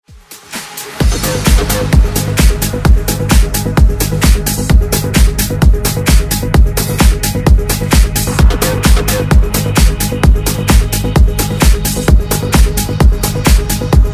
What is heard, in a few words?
Dance Ext Redrum